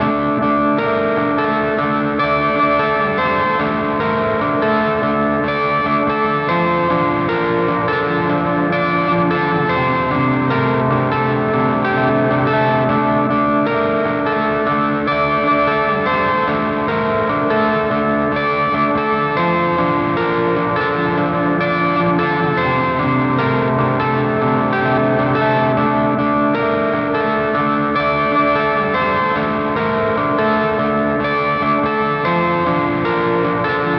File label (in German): Mooer Nux Zerrpedal Delay Reverb